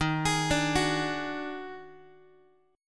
D sharp Minor Major 7th Double Flat 5th D sharp, down, Guitar; arpeggiated; chord; x,11,11,12,10,x